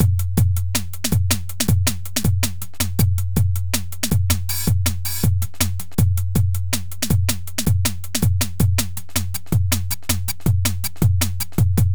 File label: drum, Drum & Bass; Best Service ProSamples vol.03 - Drum & Bass 1; AKAI